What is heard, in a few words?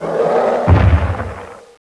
elephant die; Caesar III